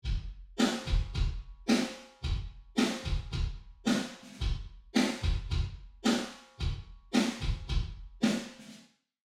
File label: kick snare wet